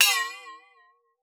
WATER, PERC; Xsample VOL-9

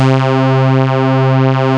SYN BASS

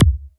MKS80 BD; DANCE KIT

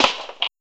ROLL SNAP, rhythm loops; Rarefaction - Poke in The Ear With A Sharp Stick

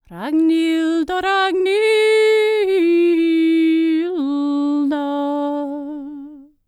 Nord, World Voices; ILIO; Vocal Planet VOL-5

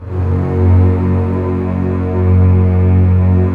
Orchestral f Slow, String Sections; Roland LCDP13

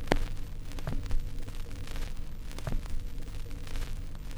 Vinyl, noise

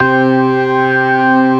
STOPS, organ combos

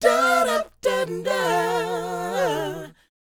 DOWOP C; ILIO; Vocal Planet

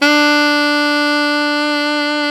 SAX ALTO FF, Alto Tube; Roland LCDP07 Super Sax

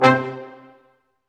BRS, Staccatos, Ensembl stac; Roland LCDP08 Symphony Orchestra

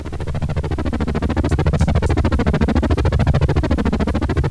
Helecopter; Urban Shakedown

